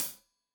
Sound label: Hat Tight, Hi-Hat, Cymbals; Deeply Sampled Drums